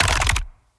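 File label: equip element; cstrike; valorant; araxys operator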